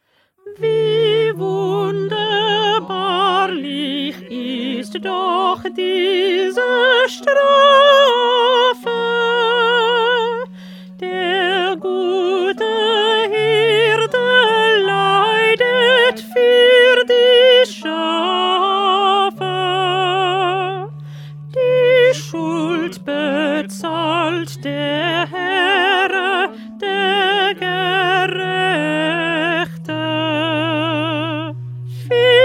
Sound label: Alto; Bach; St Matthew Passion